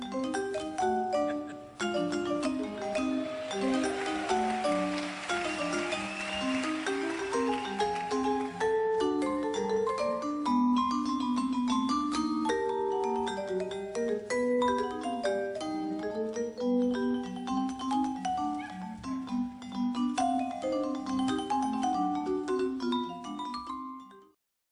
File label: Vibraphone